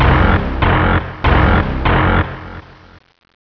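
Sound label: flag take red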